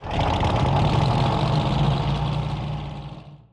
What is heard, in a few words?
boost distant